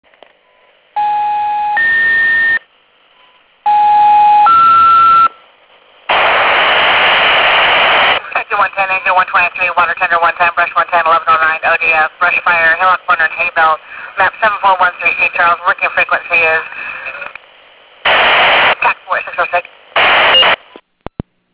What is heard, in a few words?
Fire, Lightening Storm leads to Brush Fire; mutual aid brush fire in Estacada; Hillockburn; August 2008 – JB Ranch; The pager toned out at 6am Sunday morning for a mutual aid brush fire in Estacada.